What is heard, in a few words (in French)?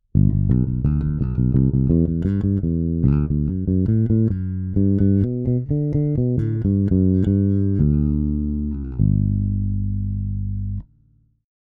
basse eq; rupert neve portico